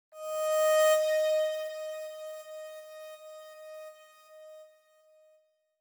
Transition Thin Sweep Cinema

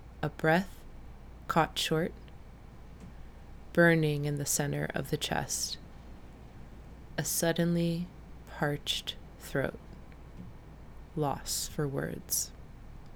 BREATH

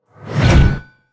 blade

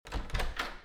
Door Opening

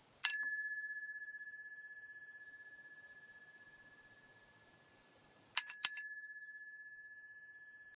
Bell

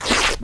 cartoon mixed texture tear

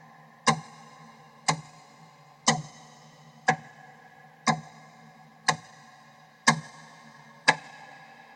Clock ticking

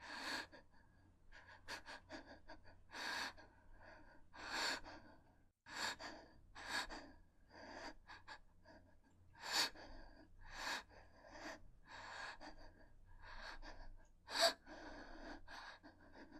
Breathing

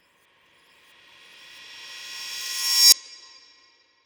CINEMATIC RISER SEISMIC

